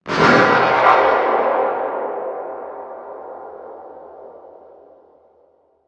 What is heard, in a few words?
Shotgun Distant Fire; Prison Architect